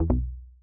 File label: Wrong; human expenditure program bloodmoney 2